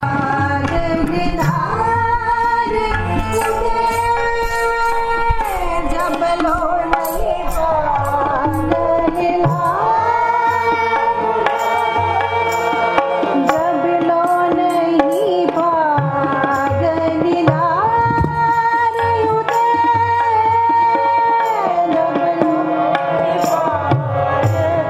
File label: Keertan, Rainsbhaee; Fremont Gurdwara